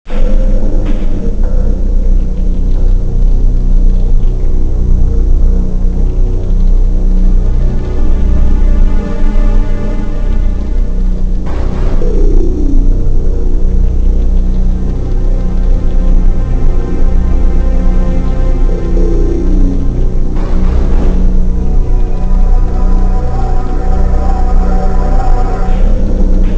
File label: sewer; music; revil